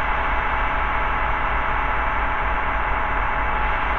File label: InfiniVerb Noise, sparse soundscape Loops